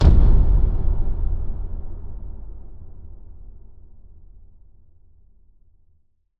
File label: Impact Boom Thud; bottlerocket